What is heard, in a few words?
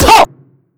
soft hit clap; osu